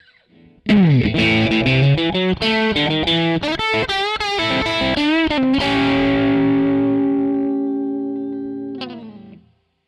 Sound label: Crunch Commercial; tube